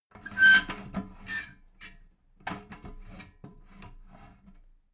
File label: metal ladder small handle short